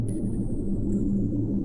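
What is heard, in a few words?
Music Dpad Evaporate 145; from dust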